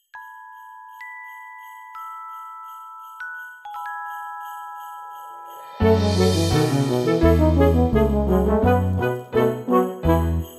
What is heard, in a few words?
Jingle Bells Chorus only